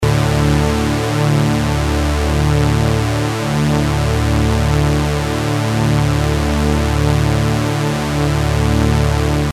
strings clear; obx; vst audio; opxvst

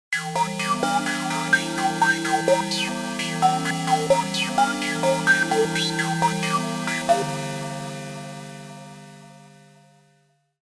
stereo tones; opxpro

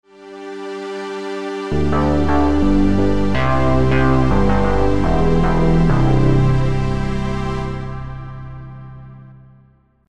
bass drone; opxpro

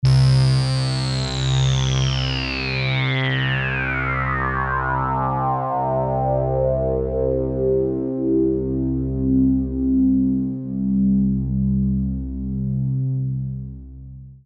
downsweep; obx

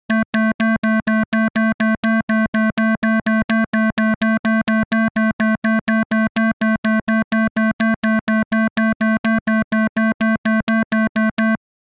filters tuned; obx; vst audio; opxvst

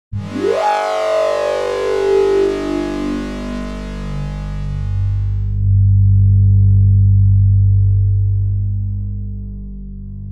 env mix sweep; opxpro

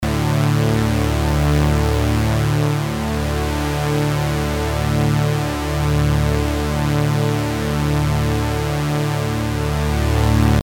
strings original

